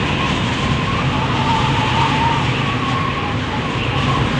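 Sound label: tornado, disasters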